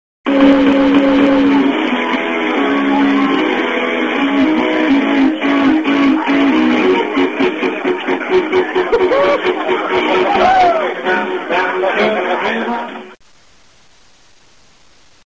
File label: river remix